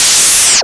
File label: lazer; SMB3Memes; EoSD Chiptune